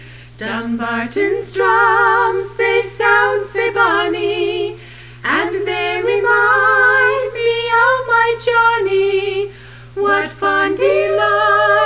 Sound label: drums short mono; dumbartons drums